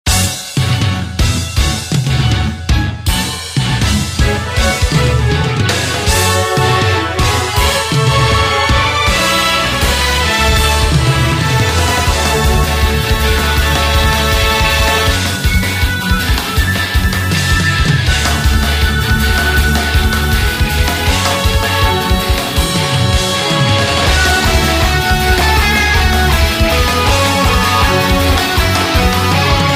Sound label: Battle BGM